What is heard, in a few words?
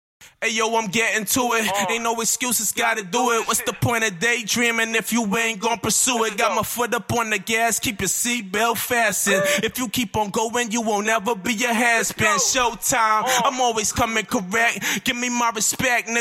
Rap 166 Bm